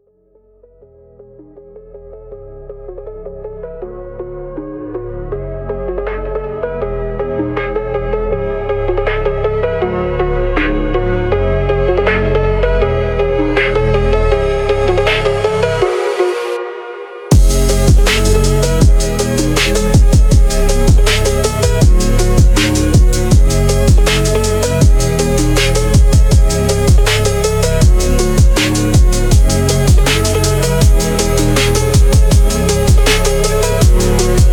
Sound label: night phonk